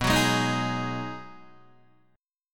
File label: B 6th B down Guitar Open D